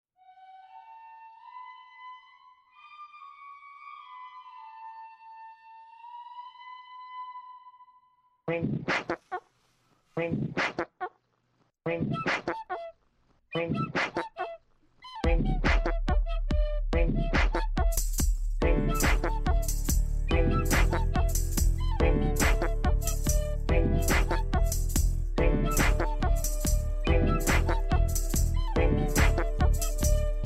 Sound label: fart song